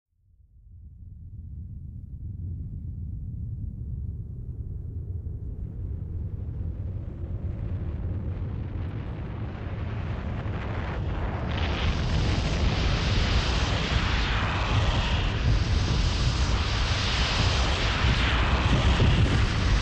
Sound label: Thunderstorm heavy winds